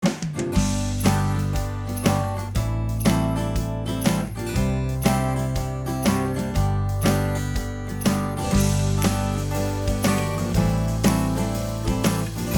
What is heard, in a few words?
INSTRUMENTAL